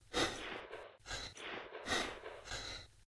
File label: breath, human woman; Stalker Anomaly